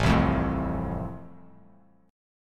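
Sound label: A Minor Sharp 5th, chord strum; Am#5 strummed; JGuitar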